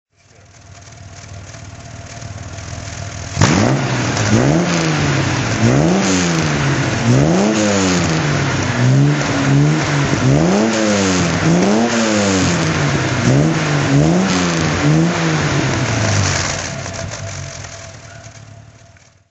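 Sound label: aston DBS throttle, engine sound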